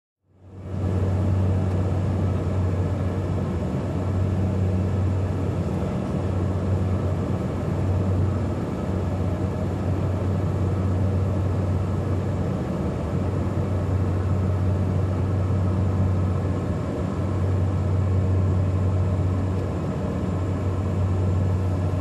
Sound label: Aircraft Near Engine Room Hum